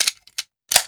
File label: SMG Reloaded